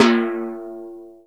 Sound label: KETTLEDRUM, TYMPANI; Greytsounds VOL-1